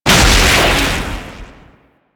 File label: Explosion, Spear Gun; BE Ferrox